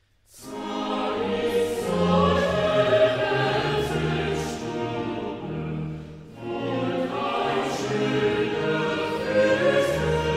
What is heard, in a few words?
cadences